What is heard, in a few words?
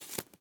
Face Mask, Medical; Blastwave